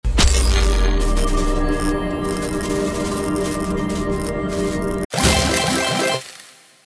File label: portal